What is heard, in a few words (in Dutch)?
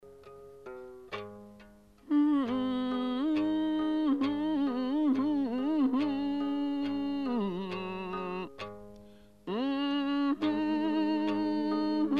Schaalciter; congo drc